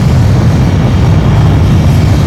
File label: polycraft engine